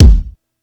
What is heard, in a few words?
Kick Gate Heavy, Yamaha PSR E305